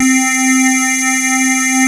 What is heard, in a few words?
JU PULSE; Pro-Rec - Synthsonic; JV ANALOG